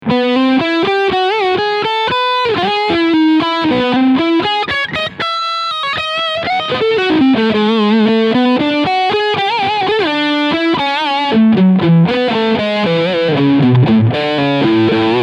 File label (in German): Leadsound Steg PU